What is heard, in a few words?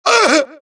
death, dialogue, Allies; mohaa; Mission 1